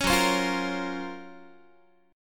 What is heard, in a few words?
Gb Major Sharp 11th, Guitar; GbM#11 strummed